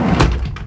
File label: door slide; Tony Hawk's Project 8; XBOX; houses